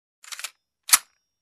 Reloading machine gun

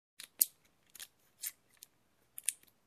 Handcuffs subtle clicking

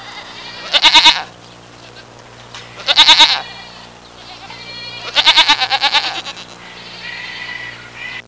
Old farm goat bleating

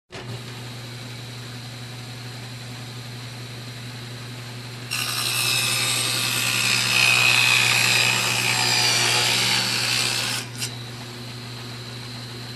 Electric Cutter Machine on action

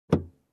Car door handle inside

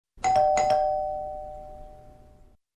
Doorbell

